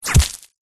warfare bullet whizz hit ground dirt small stones debris; zapsplat